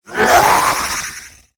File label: Nerubian Prophet Attack